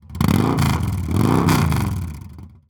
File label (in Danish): motorcykel revving